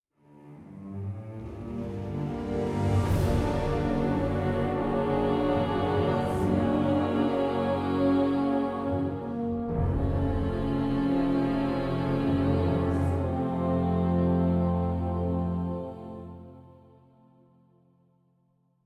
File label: mus event positive; opal